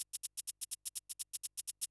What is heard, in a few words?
HIHAT HOUSE 125, Drum; eJay